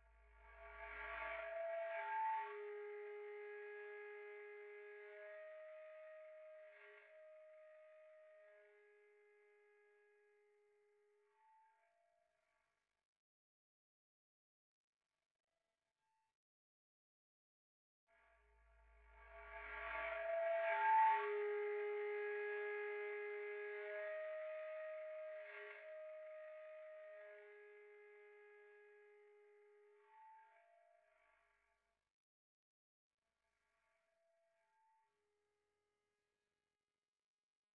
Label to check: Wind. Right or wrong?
Right